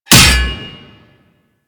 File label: Axe Impact Default; Warframe; Weapons